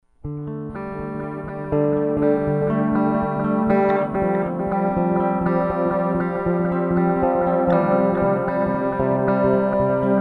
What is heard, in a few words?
Chorus at 10 o clock Delay at 10 o clock